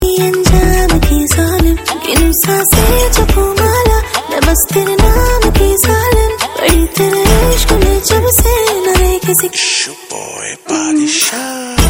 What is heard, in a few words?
music